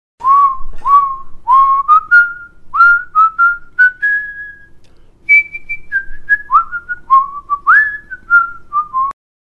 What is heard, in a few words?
Whistling